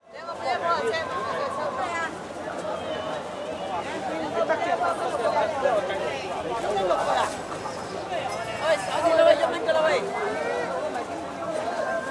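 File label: Hong Kong City ambience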